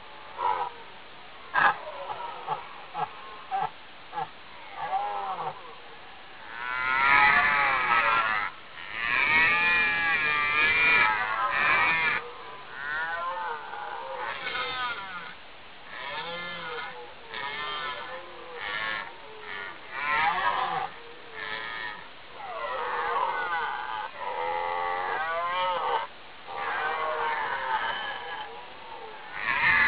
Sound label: Deer Roar